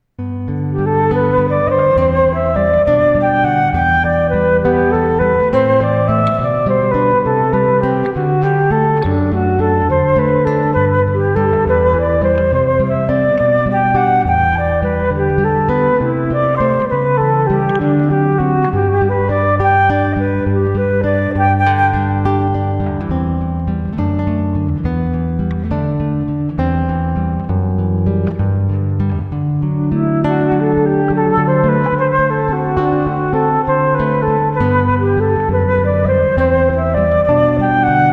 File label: flute guitar